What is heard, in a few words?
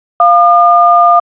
dtmf